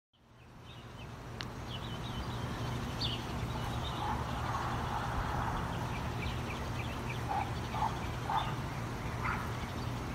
Outside Ambience

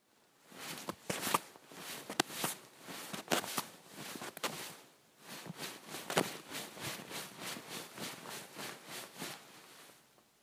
Clothes Rustling

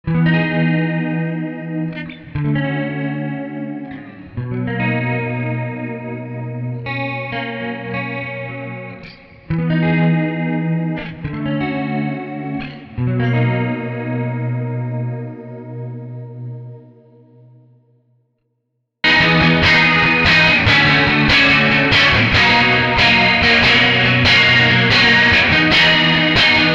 Shoegaze Dreampop Electric Guitar

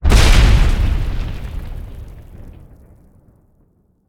Powerful Explosion

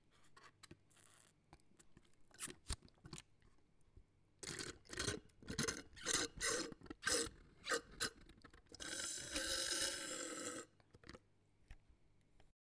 Slurping

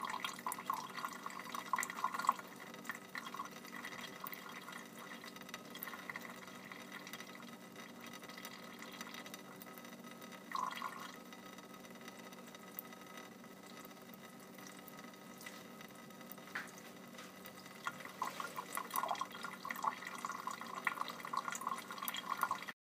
Sink Kitchen

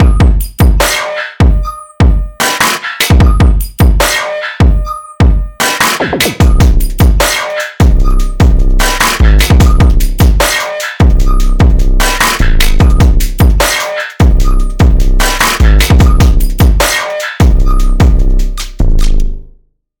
Drum Loop 150 C; Chlorophyll

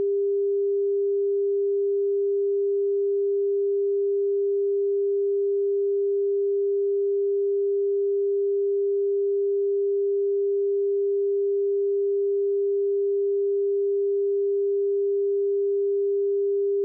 400hz 20 Dbfs

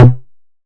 Bounce

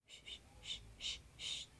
Shhh

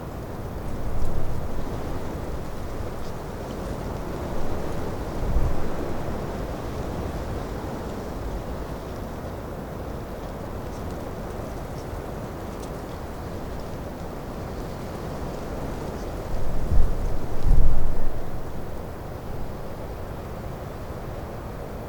Wind Bushes

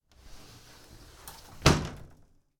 Window Sliding Shut

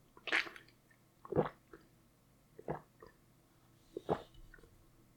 Drinking Swallowing

